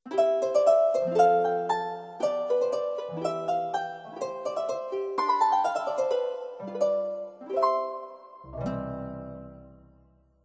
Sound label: harp, Yamaha PSR-530